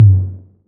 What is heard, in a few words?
Royality free tr 808 synth tom tuned to the F# note; tom drum samples